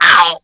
Little Hob Damage

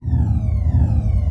RUN

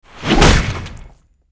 giant skill

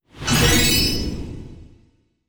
Gate Open

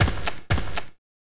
Old Fox Walk; Seer; GamigoNA

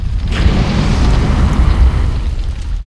Stone Action; Seer; GamigoNA

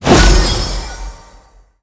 Alchemist Skill; Seer; GamigoNA

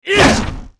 Spearman Skill; Seer; GamigoNA

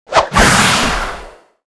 slash

